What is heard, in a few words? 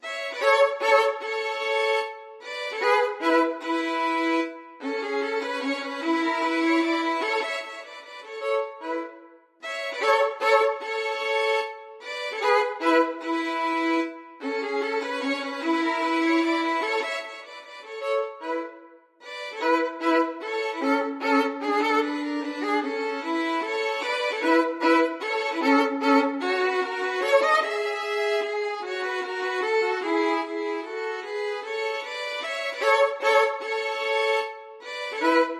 duos menuett violin